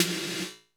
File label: GT S808, GATE